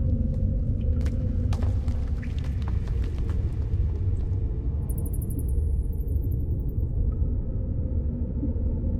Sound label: ambience cave; everness